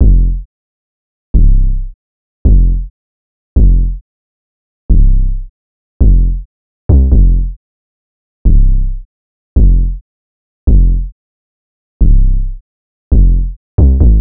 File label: 808 loop 135 Fm; Slate Digital BASSHOLE Sample Pack